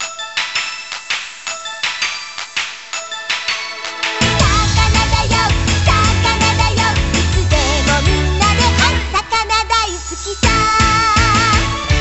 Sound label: BGM Opening; Mezase Tsuri Master DS; NDS